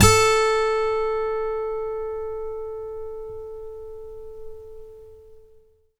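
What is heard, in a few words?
GTR A1 12; Synclavier Sampler Library; KEYS AND GUITARS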